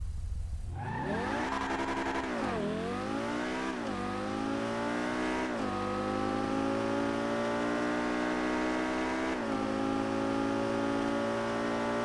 super v10, vehicle sounds; engine sounds; vacca,reaper,tenf,tenf2,tempesta; FiveM

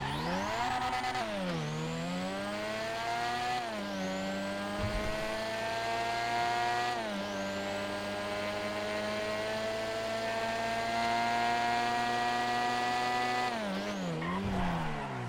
street r2, vehicle sounds; engine sounds; zr350; FiveM

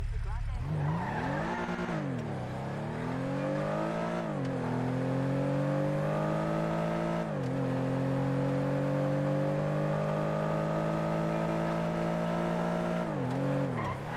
saloon v6, vehicle sounds; engine sounds; police3; FiveM

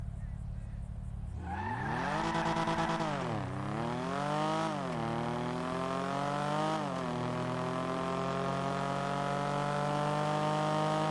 street v6, vehicle sounds; engine sounds; euros,eurosx32; FiveM

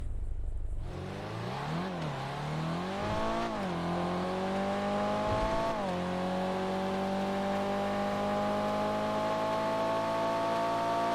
sport f4, Vehicle Sounds; engine sounds; sultan,sultanrs,s95; FiveM

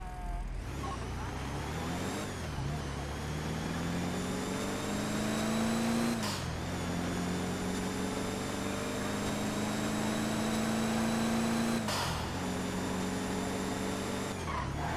diesel v8, Vehicle Sounds; engine sounds; ambulance,riot,coach,trash,trash2,stockade,stockade2; FiveM

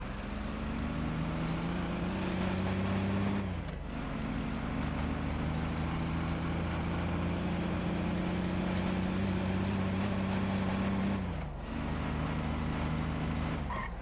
diesel i6, vehicle sounds; engine sounds; towtruck, towtruck2; FiveM

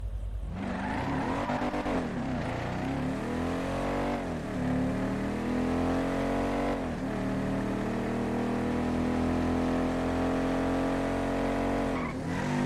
muscle v8, vehicle sounds; engine sounds; police2,fbi,buffalo; FiveM

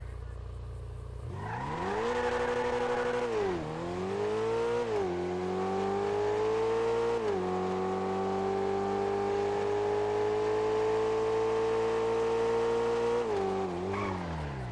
super v8, vehicle sounds; engine sounds; turismo3,stingertt,italirsx; FiveM

